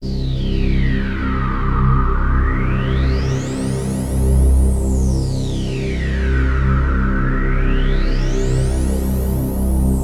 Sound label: Access Virus B; Synthworx